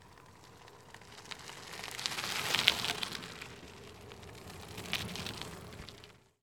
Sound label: Passing Bikes